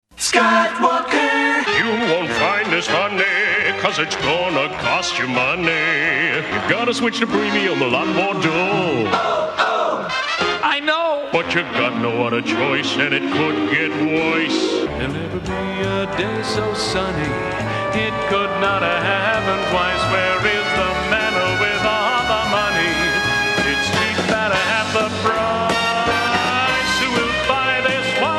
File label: singing